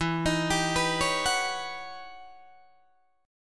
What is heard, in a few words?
E Minor Major 13th E Guitar DADGAD; EmM13; arpeggiated